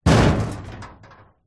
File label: impacts metal hollow cabinet old knock hard rattle